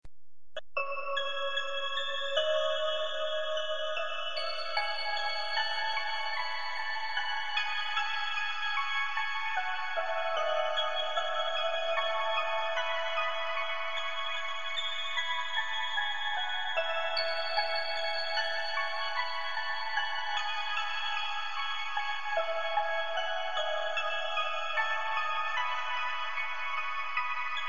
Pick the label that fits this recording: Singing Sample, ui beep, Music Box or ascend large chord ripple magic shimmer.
Music Box